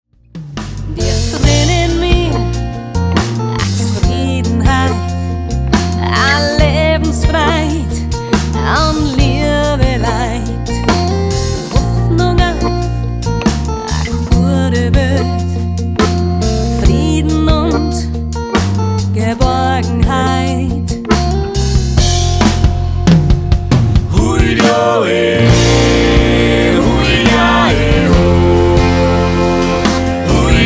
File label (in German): Almjodler